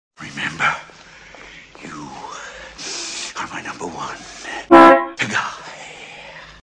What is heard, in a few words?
Honk